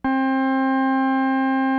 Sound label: CX3 OVDRV, CX-3 organs; Greytsounds